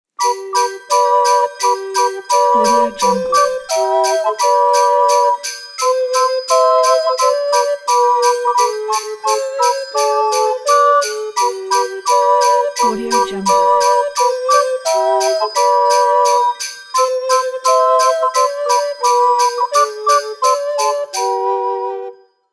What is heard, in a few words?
jingle bells